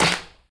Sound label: clap f key, Royality free clap sound clip tuned to the F note. Loudest frequency: 6962Hz; percussion; single hits one shots